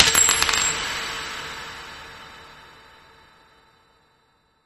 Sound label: Shell Shock Casing Drop